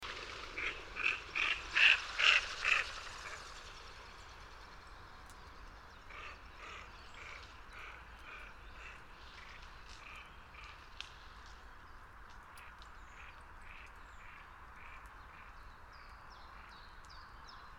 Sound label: cpoch FL TOC; grabschue see